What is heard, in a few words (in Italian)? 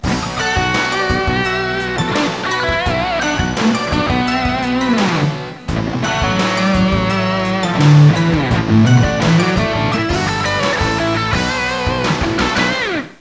suonerie musica rock